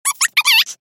clock

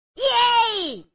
yay

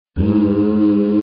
bah

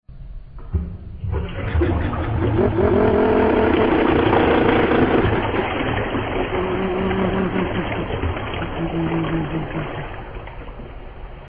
flushed

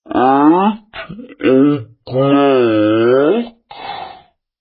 clock

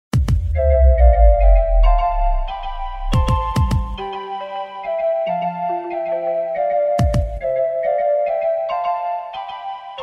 dub

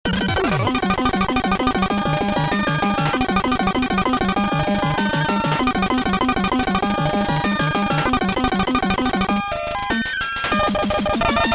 shovel bottle

